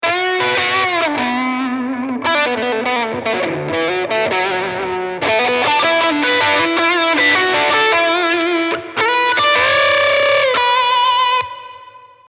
Maul used as a guitar booster - cuts in half way through